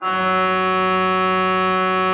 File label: F3, Cathedral Organ, HOLZPRINZIP; Propeller Island